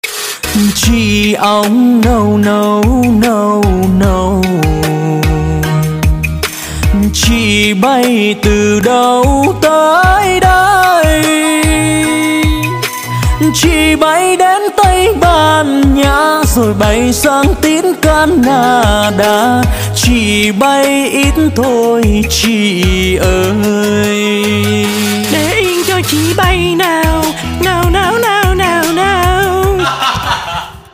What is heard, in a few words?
Opera; nhac chuong